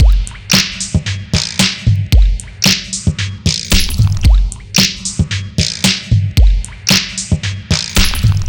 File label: Living Music